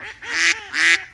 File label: duck; jaws